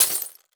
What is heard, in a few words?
Impact Mallet Hit Glass Debris Beer Bottle